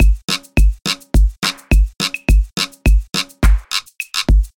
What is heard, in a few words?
Mexican Beats